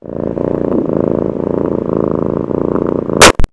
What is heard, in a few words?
purr, Cat Sounds